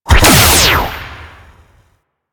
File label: Beam Attack Shot; Enemies; Warframe; Vomvalyst; Teralyst; DE